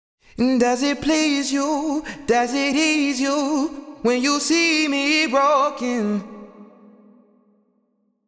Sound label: Sung Phrase Wet Broken 70 BPM G# Min; Cymatics; Euphoria Vocal Sample Pack